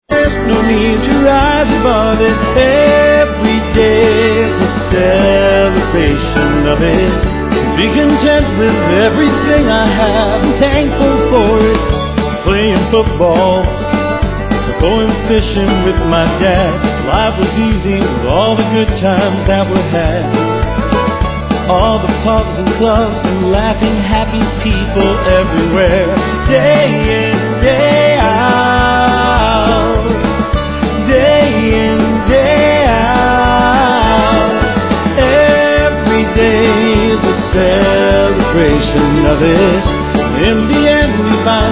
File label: Celebration